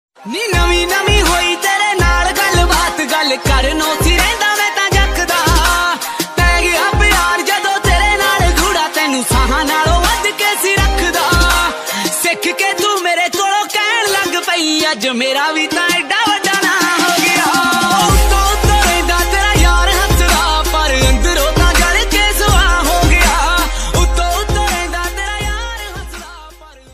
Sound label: punjabi ringtone